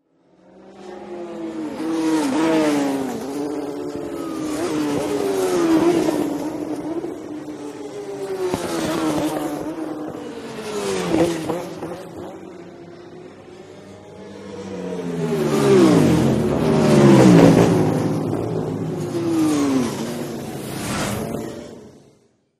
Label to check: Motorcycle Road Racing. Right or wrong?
Right